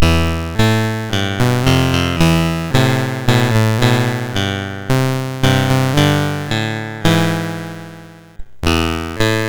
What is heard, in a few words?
sid MOS6581R4